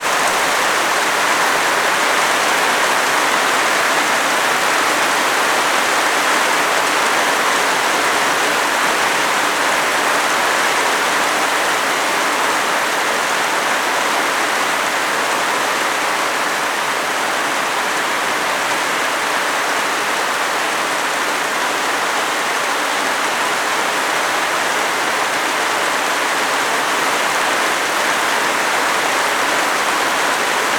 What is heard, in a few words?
nature rain med heavy